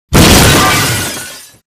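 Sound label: glass break mid distance; Titanfall 2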